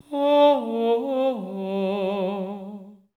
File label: FALSETTO; Spectrasonics; Symphony of Voices